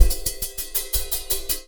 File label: Cymbal; Percussion Samples